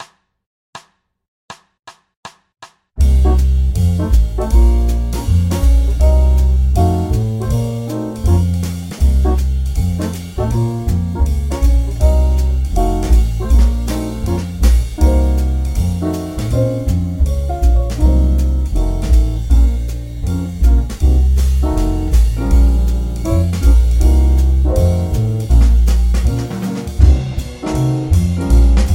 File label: Cm7b5-F7-Bbm7 2bars Loop 160